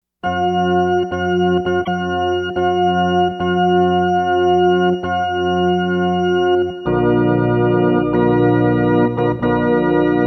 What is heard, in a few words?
Here Comes The Bride (Organ)